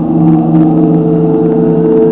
FLUX COLD; EFFECTS

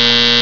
BUZZER; ALARMS

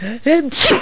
SNEEZE

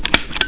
click

